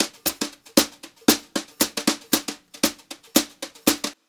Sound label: Brushed Drum Loops, JAZZY, 116